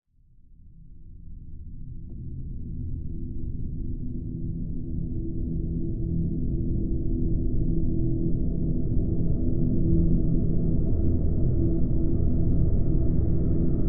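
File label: drone E; Drn Delusion E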